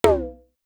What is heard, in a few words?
Conga Hi Down, Pitch Env; Clavia DDrum-AT; Pitch Env Various